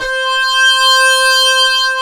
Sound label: FILTH ORG C5; AKAI S-Series CD-ROM Sound Library VOL-2